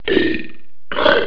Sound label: comic